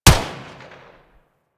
Pistol Shot, Weapons; Harlequin; Warframe; Tenno

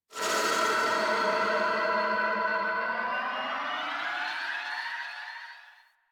Zeal Pre Teleport Cin Pads; Warframe; Emissary Season Two

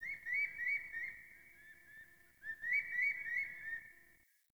Day Distant Bird; Ambience; One Offs; Glades Of Andurin

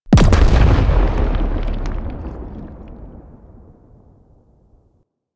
Asteroid Explosion; Hazards; Warframe; Railjack

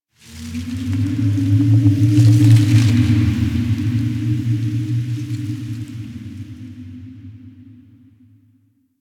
Park Wind; Warframe; Quests; TheNewWar; Ambience; Zariman

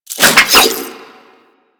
Whip Spin; Grineer

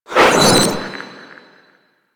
Glass Shank Cast; DE; Warframe

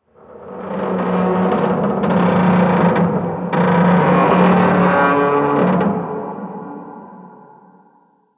Exterior Metal Debris Creaks; Props; Warframe; Railjack

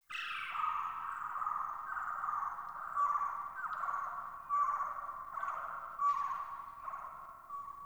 Cave Bats; Ambience; Dungeon Island; Duviri; Warframe

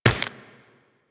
Run Flesh, Footsteps; Warframe; Tenno

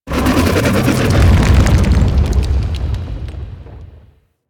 Burrow Enter; Ambience; Golden Maw; Warframe; The War Within

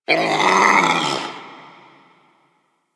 Forest Creature Low Mid Far; Warframe; Grineer Forest

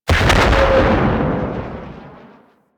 Enemy Ship Initial Death Explosion; Rail Jack

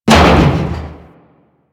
Arachnoid Camper Step Metal Debris; Warframe; Corpus

